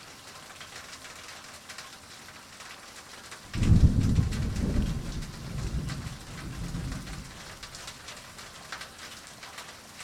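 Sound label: rain indoors with thunder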